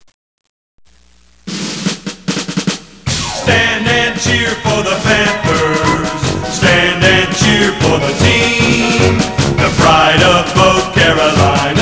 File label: panthers fight song